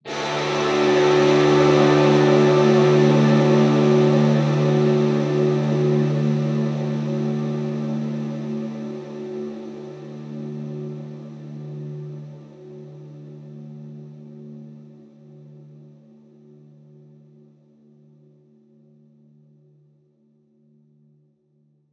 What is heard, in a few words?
Guitar Chords Dmaj; Zero-G - Future Wave